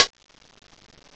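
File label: HI-HAT CLOSED; CMI Sound Library Rev 1.3; CYMBALS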